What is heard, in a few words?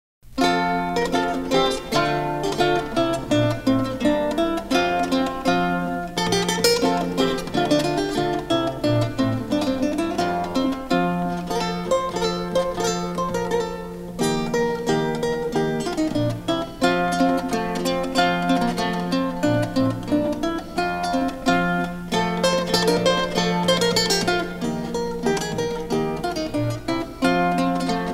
Jig, Consort Music; Anonymous; Kemps; Deller Consort; Shakespearean Songs and Consort Music